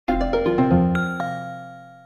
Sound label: power; Huawei U2800 ATT